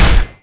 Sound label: attack; Omniknight